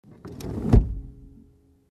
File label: Car Seat adjustment